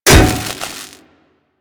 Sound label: Electro Prod Hit; Warframe; Weapons